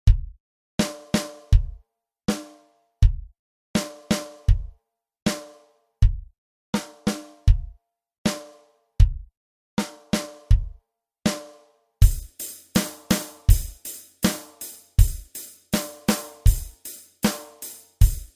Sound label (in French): batterie; Rythmique 2; Constantes et modifications dans la musique jazz